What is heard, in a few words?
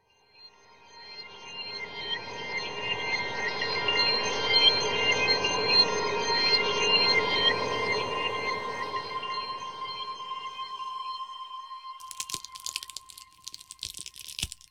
seed Materialize; keepsake